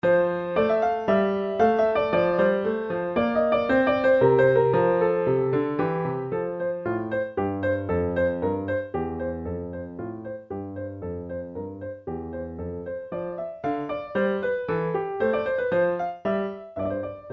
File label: Bach Menuet Fa Major